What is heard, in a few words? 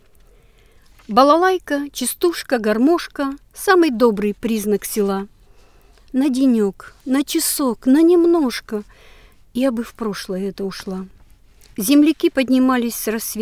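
balalaika chastushka garmoshka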